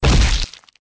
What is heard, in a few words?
head explode, bullet impacts gore; Soldier Of Fortune II Double Helix; XBOX